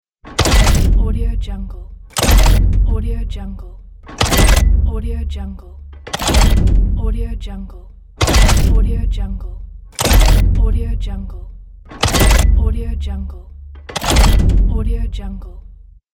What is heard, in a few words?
metal robot steps